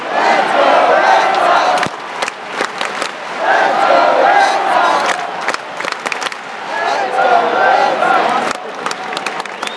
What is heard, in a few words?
Lets go Red Sox